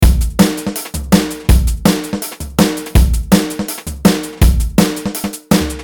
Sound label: 165 BPM; Praise God; bf sample pack bundle; Unison